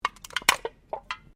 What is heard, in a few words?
CAN SOFTDRINK BEND; various metal cans; Materials